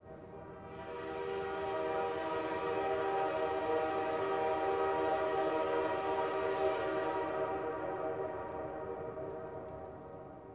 distant train; E-MU Producer Series Vol. 3 – Hollywood Sound Effects; Ambient Sounds

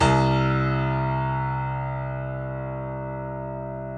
PNO XYPNO, Grand Pno, Roland; Club-50 - Foundations; Grand Pno 3

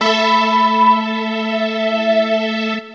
Dream Pad 5th; Keys; Pads; Drum & Bass

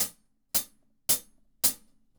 Hi-Hat Groove; Sonic Foundry (Sony Creative Software); Vital Drums

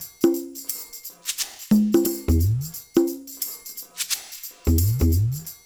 loop, percussion; InVision Interactive; Percussion Slam; KWICKIE

